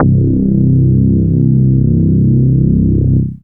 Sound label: Single Electric Basses, Dance Floor Bass